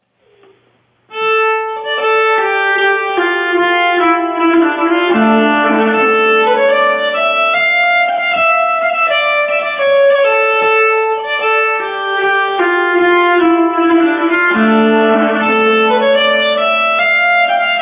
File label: Polska, nyckelharpa